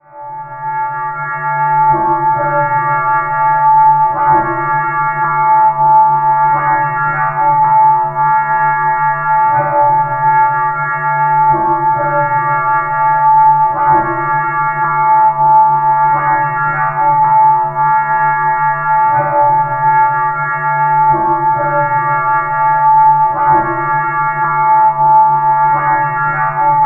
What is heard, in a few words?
Machined Ghost; Sonic Foundry (Sony Creative Software); Loop Noir